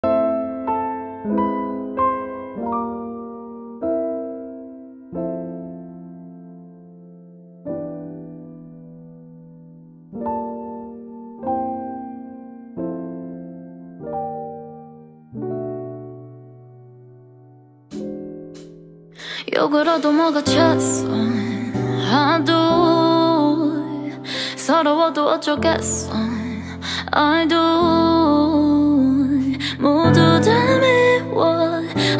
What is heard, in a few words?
acoustic